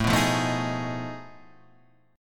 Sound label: F 7th Sharp 9th Flat 5th A